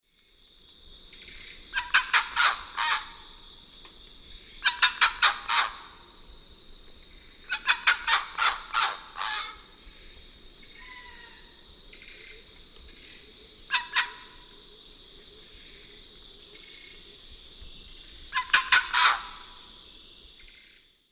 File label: Ibycter americanus, calls; Eastern Lowlands